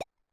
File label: collect; grunk; New grunk collection SFX